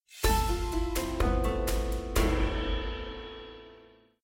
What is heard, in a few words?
Intro Tune